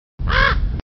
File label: crow